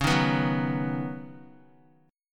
Db 7th Suspended 2nd Suspended 4th, Guitar; x,4,3,4,6,x; Guitar-4F,4A,5C,5G,5C,6E; Db7sus2sus4 strummed

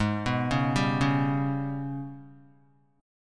G sharp Minor Major 7th Double Flat 5th, Guitar; G#mM7bb5; arpeggiated; 3,2,1,0,1,x; 4F,4A,5C,5G,5C,6E; JGuitar

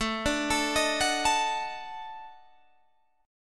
D sharp Minor Major 7th Flat 5th

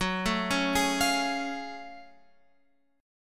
F sharp Minor Major 9th F sharp

